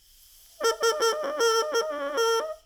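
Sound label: Crow, Modern French Style Bassoon Reeds; The width of the reed at the collar was 0.33 in (8.38 mm). The reed had a string wrap.; Ewell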